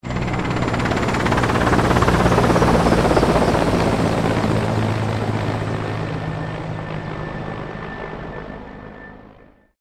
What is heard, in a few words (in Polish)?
helikopter